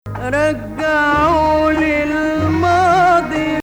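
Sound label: Rast Nahawand, Arabic music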